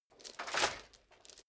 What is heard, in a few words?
metal chain movement on plate single